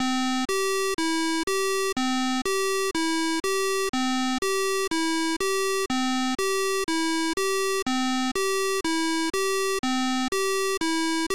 Test Song; Mario Paint Prototype

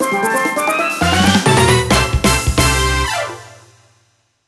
Mini-Game Win

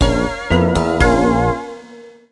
Losing a level; Clattering Cabin

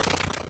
Break Block; SSBM

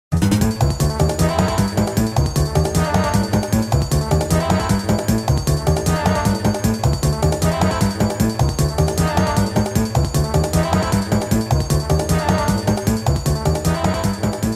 Item Trumpet

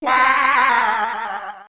Waaaaahh

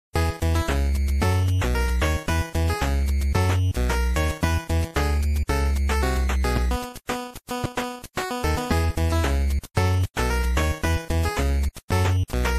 Theme; Mario's Time Machine; 80M BC; NES

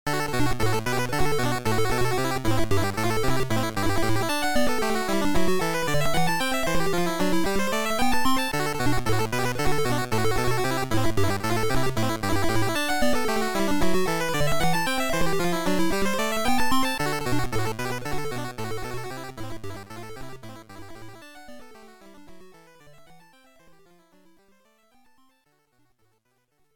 Power Spray Theme; DK3 Arcade

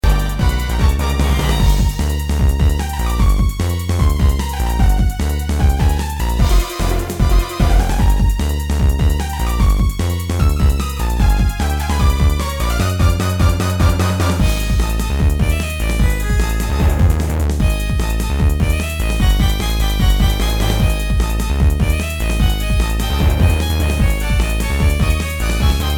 Boss Battle

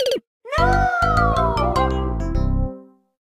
Rosalina death music; SMM

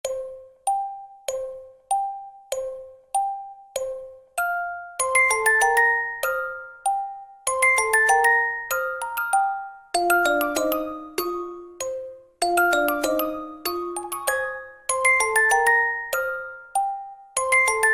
Underground Night; SMM2; NSMBU

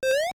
Camera; SMBDX

